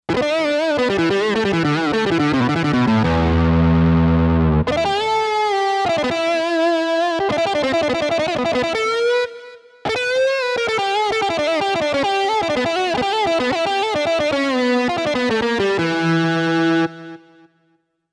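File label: Distorted Guitar; Roland SC-880; Factory Patches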